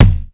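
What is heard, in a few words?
24 KICK; Hollywood Edge; The Big Bad Beatmachine; HR-16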